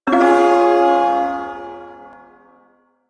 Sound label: Shawzin Phrygian Chord; Warframe; Duviri Ersatz Shawzin